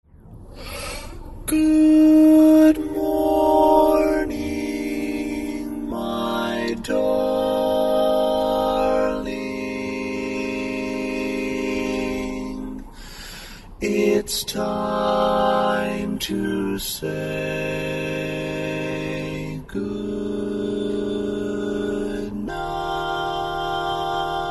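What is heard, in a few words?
Baritone, Barbershop Tags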